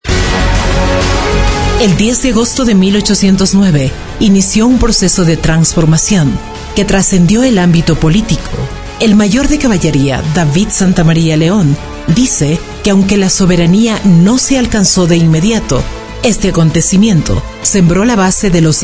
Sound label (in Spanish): grito; TUNGURAHUA